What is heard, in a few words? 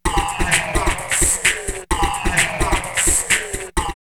drumloops Rave 129; X-Static Goldmine; 90 s Sample CDs